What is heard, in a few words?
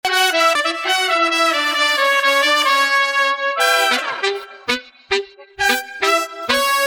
brass all; multitracks